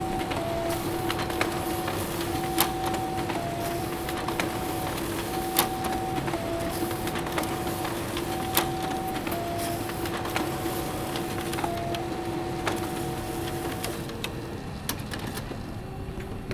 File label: printer